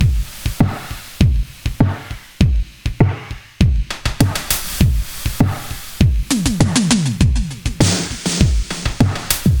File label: 100 Beat Muffled; Zero-G; Future Wave; Beats